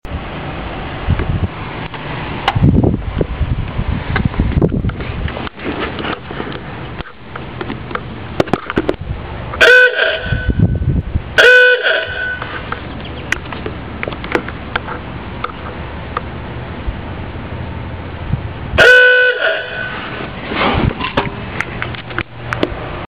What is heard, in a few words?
Aooooooooooga